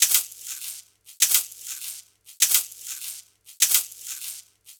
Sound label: HouseHold Shaker (100BPM)